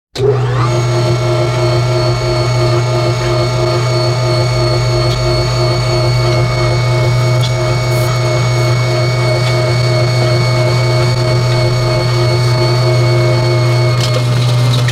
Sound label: meat grinder operating; household; sound effects; sound effects one